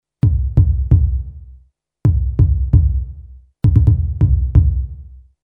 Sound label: Bass Dr trapped noise